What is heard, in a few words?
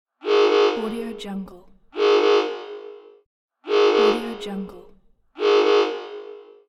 prison siren